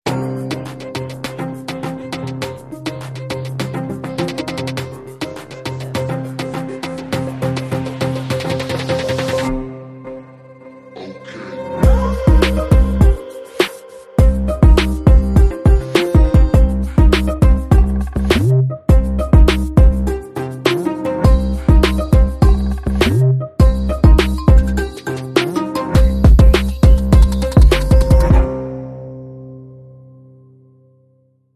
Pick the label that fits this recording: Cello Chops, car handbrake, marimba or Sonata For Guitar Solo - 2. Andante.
Cello Chops